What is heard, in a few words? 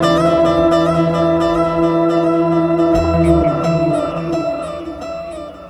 Bass FX